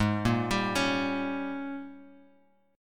G sharp Major 7th Suspended 2nd Suspended 4th, Bass Standard; arpeggiated; chord; 16,13,17,18